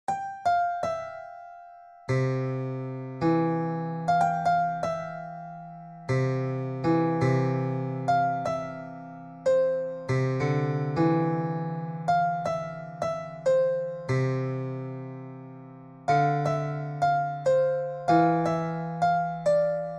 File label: Grand Piano